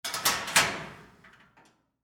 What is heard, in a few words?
Locker Open, Sports, Locker, Door, Open; Locker, Room; Miscellaneous Sport Sound Effects